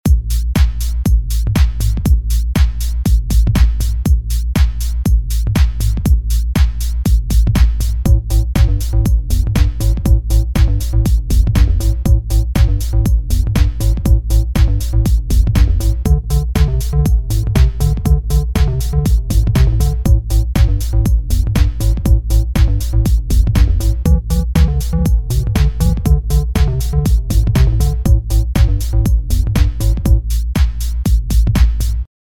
BED 60S Pop

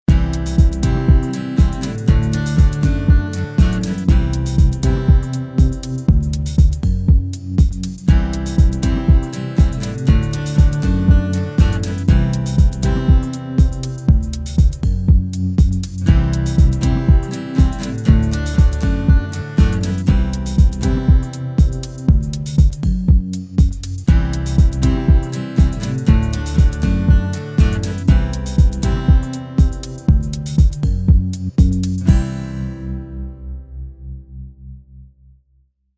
Guitar Love

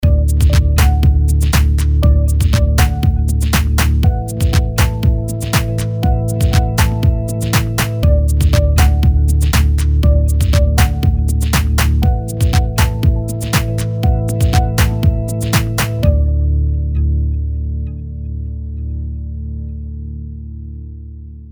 Happy Clap

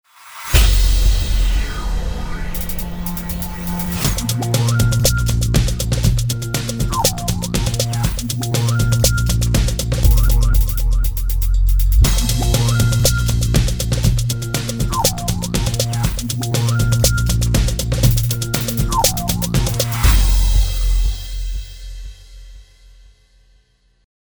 BED Warbly; Warbly Feeling Tricked a Little

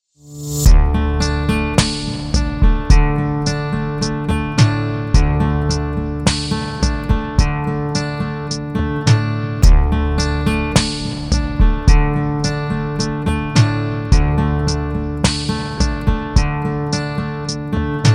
The Loneliest Guitar

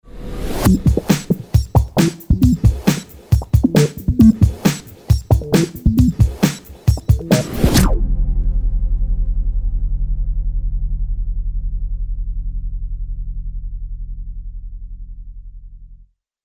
Bubble Quickly

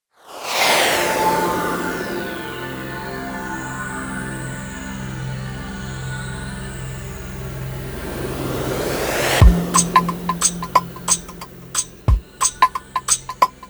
BED Meditation; Meditation Please

